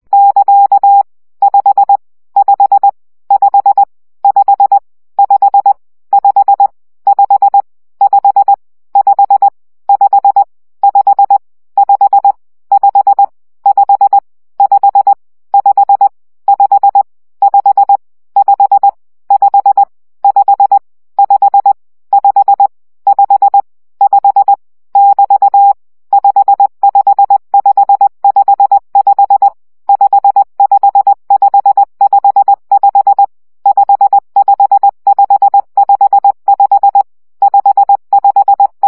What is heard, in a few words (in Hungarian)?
CW gyakorlas 1 17 baud